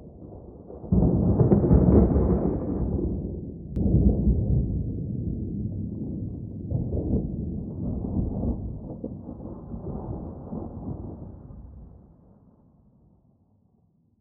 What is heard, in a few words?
thunder, ambient weather; Minecraft; Votive World